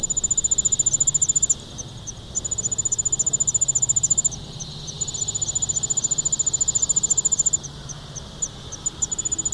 Allards Ground Cricket Allonemobius allardi; Carl Strang

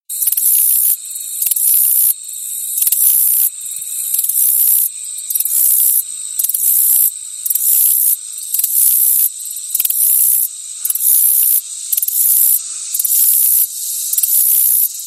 Black legged meadow katydid Orchelimum nigripes; Carl Strang

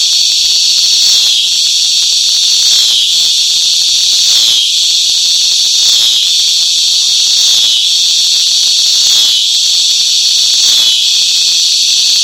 Scissor Grinder Cicada Neotibicen pruinosa; Carl Strang

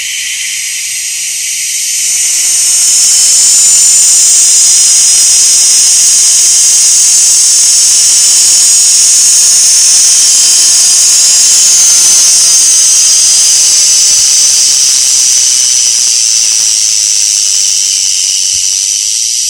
Dog Day Cicada Tibicen canicularis; Carl Strang

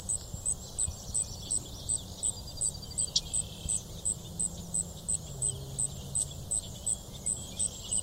Allonemobius fasciatus

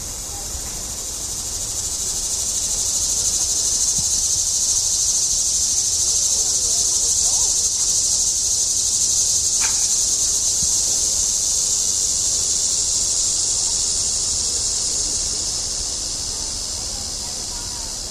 Linne s Cicada Neotibicen linnei; Carl Strang

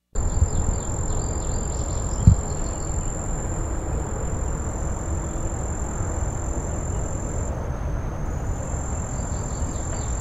exigua continuous; Fullersburg

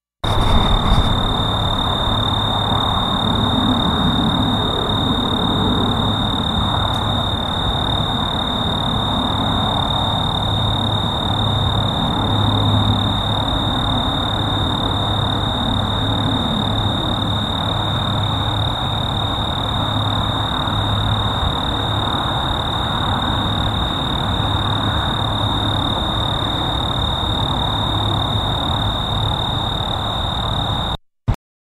quadripunctatus

